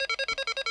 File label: sound correct; maximus